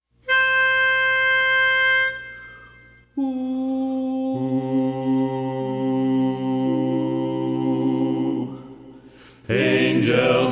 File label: bass; Angels From the Realms of Glory